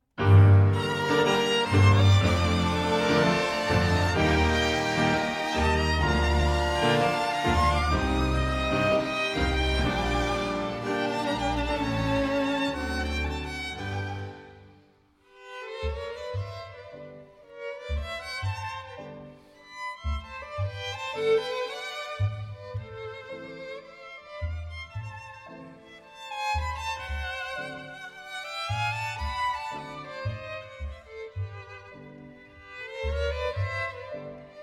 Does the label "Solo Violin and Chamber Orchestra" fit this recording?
Yes